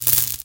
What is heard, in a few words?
spark